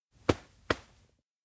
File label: sitdown leg pat; anemoi